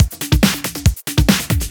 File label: Breakbeat; Vengeance Samples; VEC2 Loops